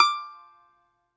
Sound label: BAGLAMA D0SL; E-MU Producer Series Vol. 7 – Old World Instruments